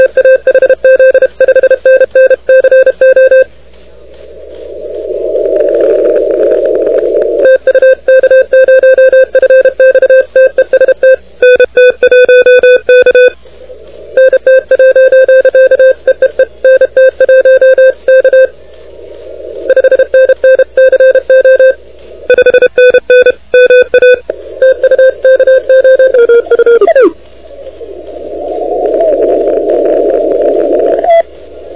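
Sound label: continuous wave 28MHz; ARRL10M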